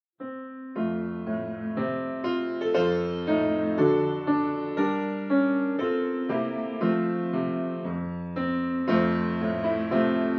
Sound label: kouka Piano